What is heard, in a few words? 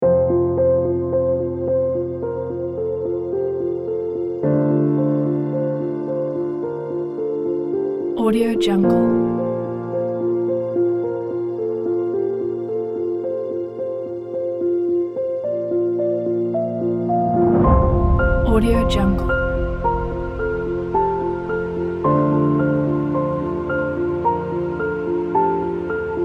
emotional inspiring piano